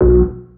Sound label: chords